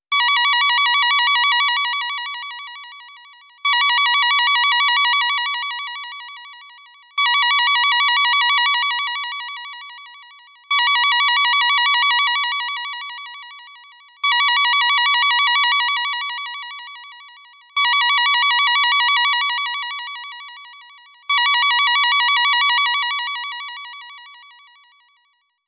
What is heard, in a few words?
Echophone